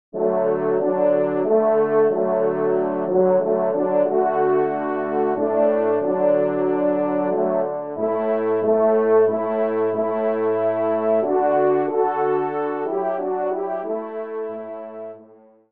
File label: Elevation; Tiberge